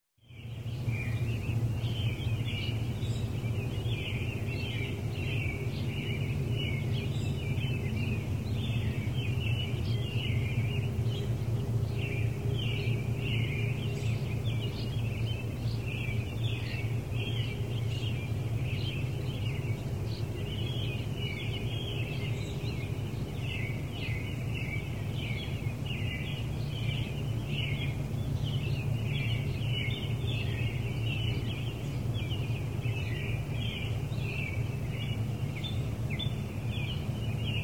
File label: Birds, Various Residential Ambience, Early Morn; Early Morning; Sound Ideas 2000 Series